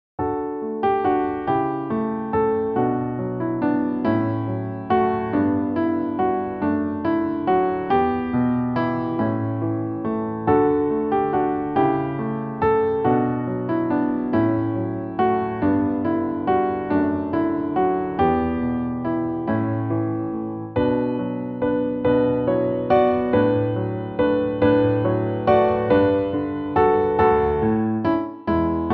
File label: Hallelujah Praise Jehovah Piano; Psalm 146; Indelible Grace Hymnbook